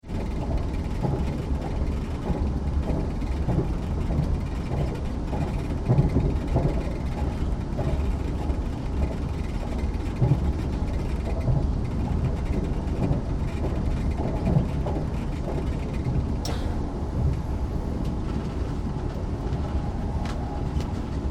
Train Electric Interior Air conditioned Through Tunnel and into Station